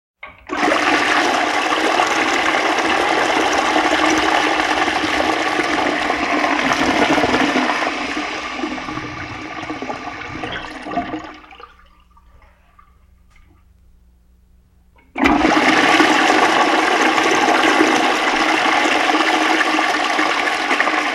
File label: toilet flushed two times; household; sound effects; sound effects one